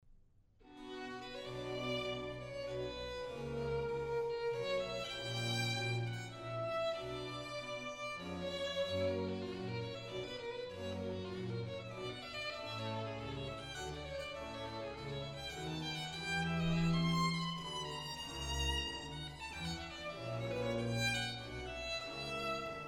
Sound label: Arie; J S Bach; Wachet auf, ruft uns die Stimme; BWV 140